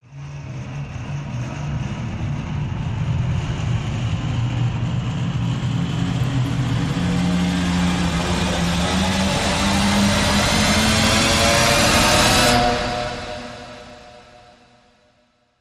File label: Raiders Jetbike Rise